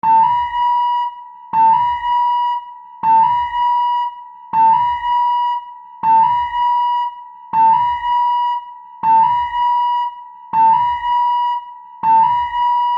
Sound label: Alarm Alien Klaxon, Scifi, Alarm, Alien, Klaxon; Tech; BlastWave